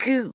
Scream

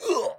Scream

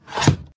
Cross down

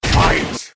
Fight

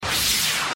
Laser shoot

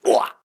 Scream

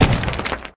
Monk attack hit

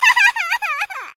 woman cry

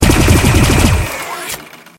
missile

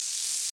Magnet

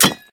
hook

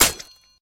kunai hit

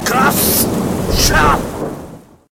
Cross shoot

